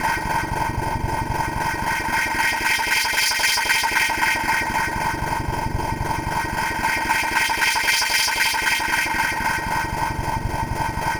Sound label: Echo Chuff Down Up, Synthetic Pulses